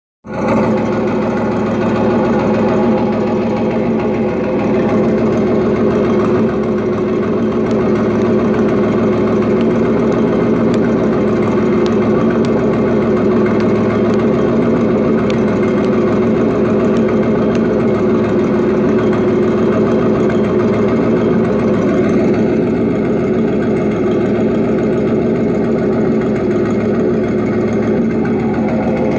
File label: Racing engines